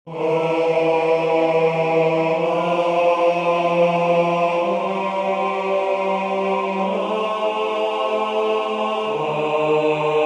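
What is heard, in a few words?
Presence 4 part bass, wisdomchant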